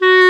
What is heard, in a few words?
WND CLAR, Clarinet Sh; Roland LCDP04 Orchestral Winds; 90 s Sample CDs